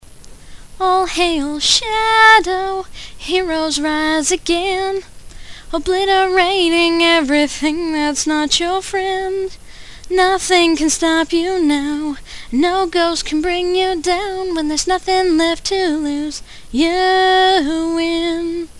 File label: Acapella